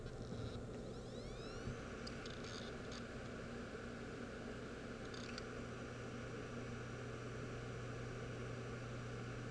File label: hd sounds, laptop, bad; mac disasters